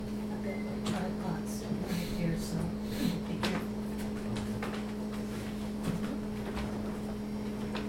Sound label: tripod evp; Springfield Carriage Co